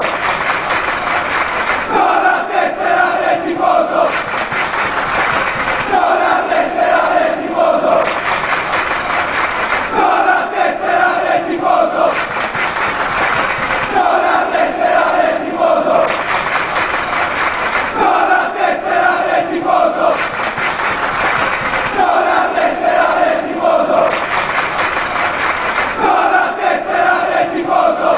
no id card, chants

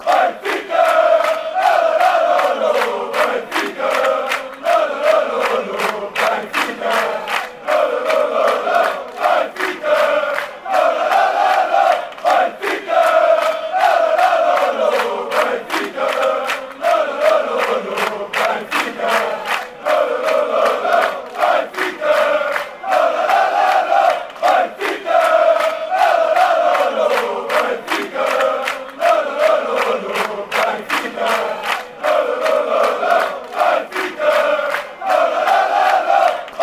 benfica, chants

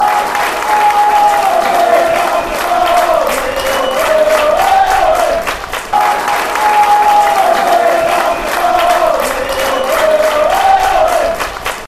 ole ole ole, fanchants; livingstone

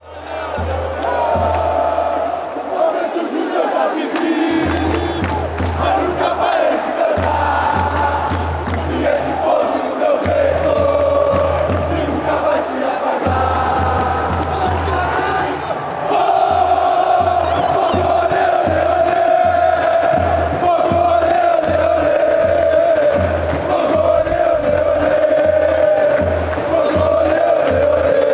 ole ole ole, fan chants; fogo

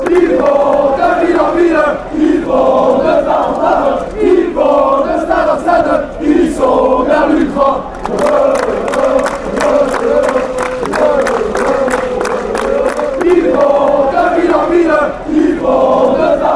stadium, chants; Real Club Deportivo de La Coruña is from the city of A Coruña, Galicia

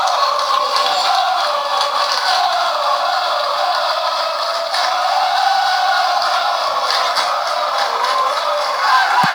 ooooo oooo oooo, chants; adelaide

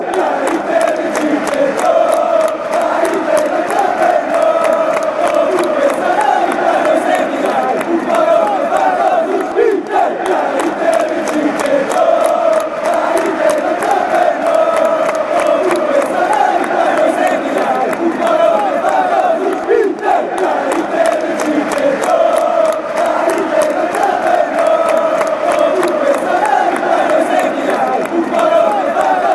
next to us fan chants; inter

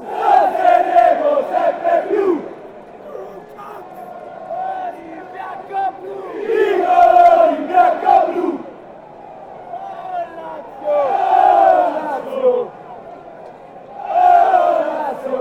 lazio chant

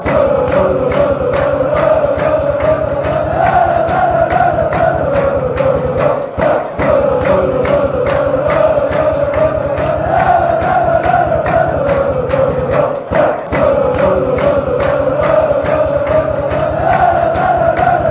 lalala lalala hey

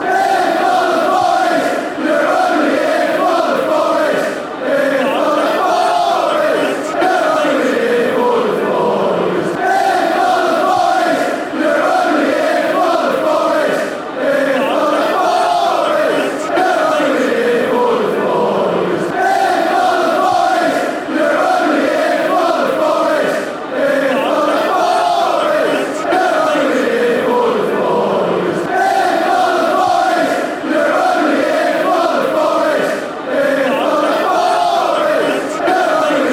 here fan chants

